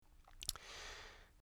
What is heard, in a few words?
lip smack; smackdown